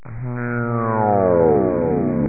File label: turn off